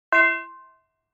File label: Orchestral chime single